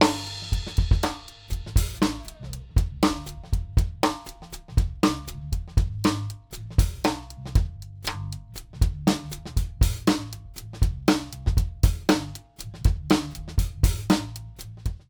Clean Mix

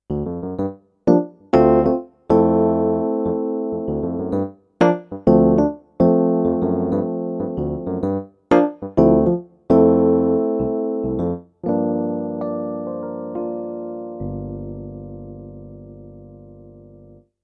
GP300 EPiano

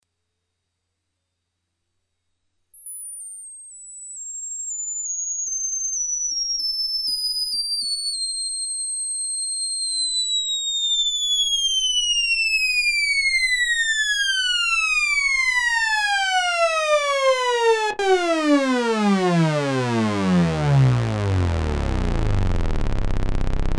MatrixBrute Ausgang Stereo Out

